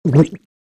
Swallow Very Close, drinking

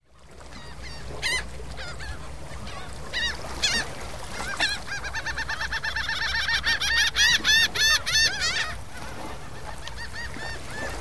Seagulls Ocean

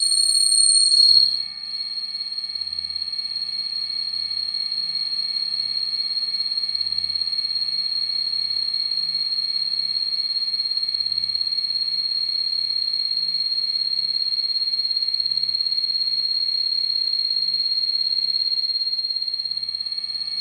Space Amb Crix High H, sci-fi ambience

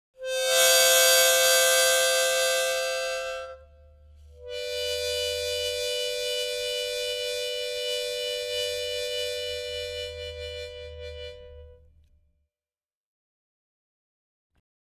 Mouth Organ, harmonica; woodwinds

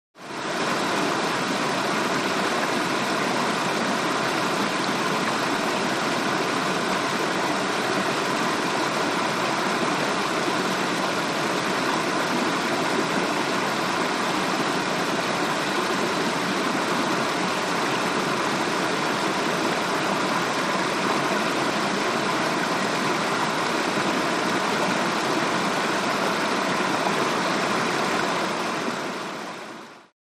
Stream 4 Large Very Fa, streams

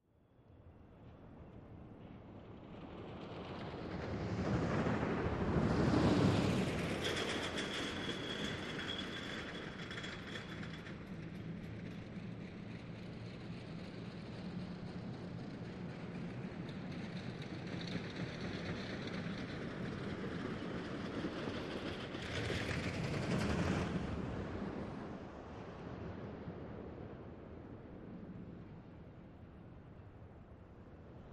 Ride Roller Coaster

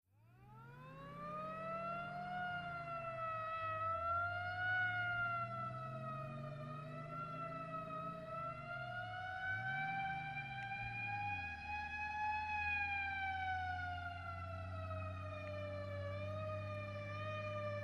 Fire Truck

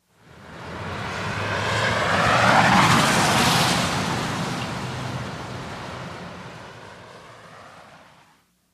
Individual cars pass on wet road.; TRAFFIC WET TRAFFIC: EXT B/G

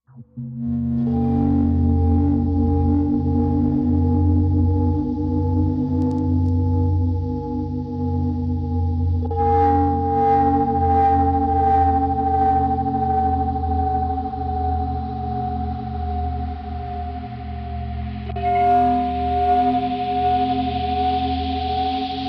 Drifting Again Eerie, sci-fi ambience

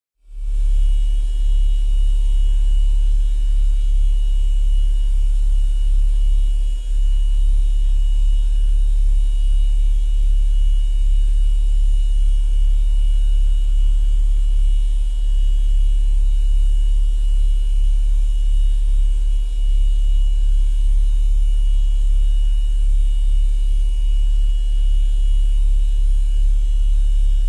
Machine Drone Low, buzz

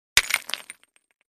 Brick Hit Hammer

